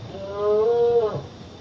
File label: attack; zoochosis pack1